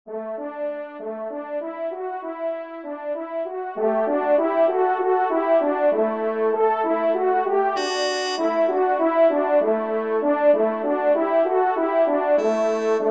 Fanfare Do Maj TRP; 1e Fanfare; Corrette

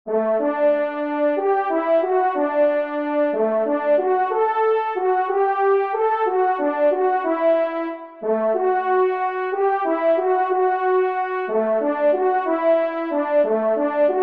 trompe

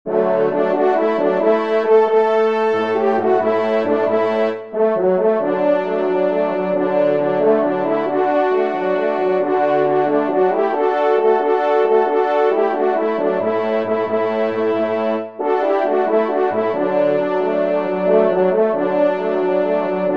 ensemble, trompe cors; En Chasse; Millot; Trompes ou Cors en Ré

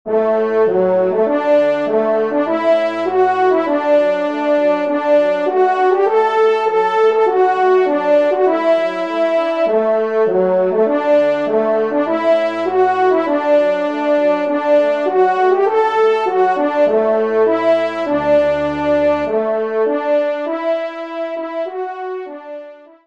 trompe; Rencontre à Notre Dame d'Anvers

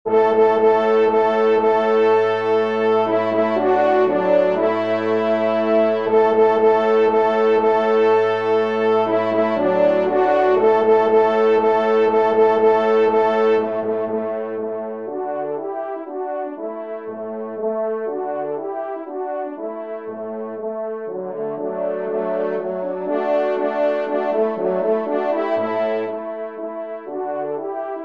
Mazurka, trompe; Les Loisirs du Chasseur n°9; Henri Sénée; Trompes ou Cors

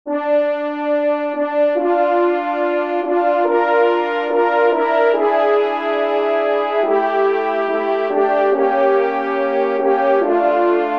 Trio Cors

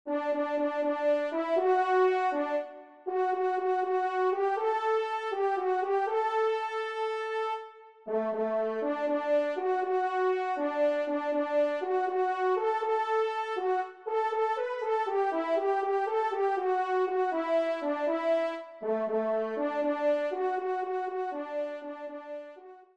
marche triomphale, trompe; 1e Trompe; Marche Triomphale des Louvetiers; MM. de Beauvais de Saint-Paul

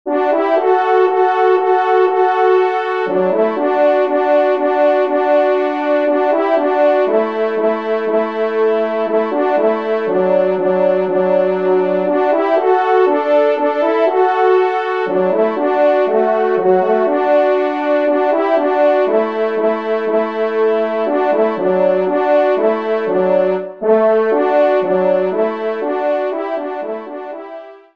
Valse, trompe; 2e Trompe; Lagard; Auteur : Arthur Lagard

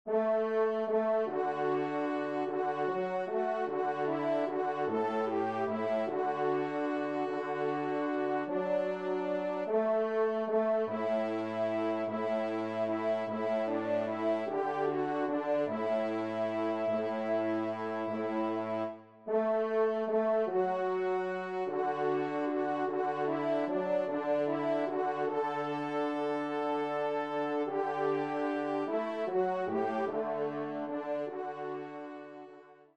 Kyrie TRP; Devert; Kyrie 1e Messe

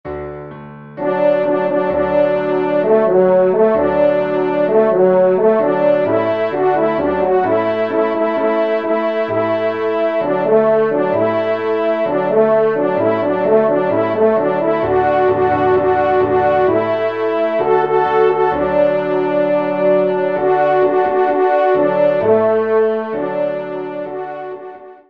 Marche Trp et Pia; Messe d'Estival

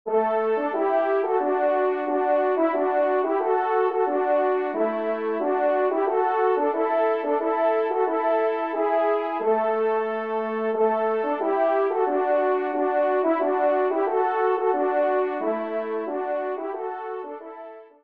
Tambourin Do Maj ENS; 3e Tambourin; Corrette

